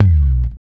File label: slides, bass; Spectrasonics; Bass Legends CD 1